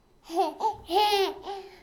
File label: Smile Girl